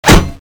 metal, weapons; csco; csgo

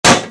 clip out, weapons; csco; csgo; skull11

